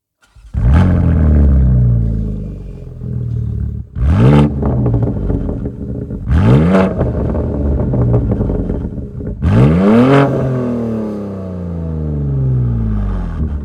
Slip On Line With Evolution Link Pipe Long BMW M4 OPF